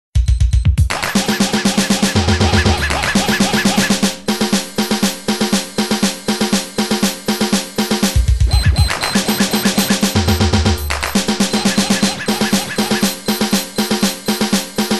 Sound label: Super Beep Beat